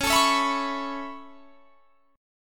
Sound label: C sharp Minor 7th Sharp 5th C sharp; C#m7#5 strummed